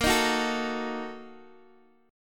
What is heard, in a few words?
Bb Major 7th Suspended 4th Sharp 5th, Guitar, DADGAD